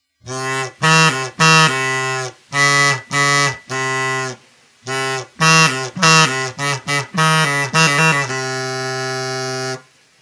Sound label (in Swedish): klarinett